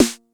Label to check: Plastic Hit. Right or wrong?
Wrong